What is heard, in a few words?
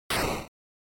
Noise Phrase, NES; NES-General